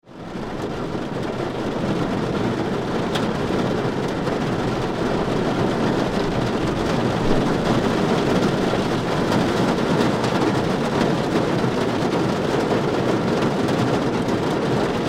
Rain onto Metal Car Roof, Recorded from inside car; Rain Sound Effects